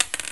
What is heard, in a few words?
ONE DICE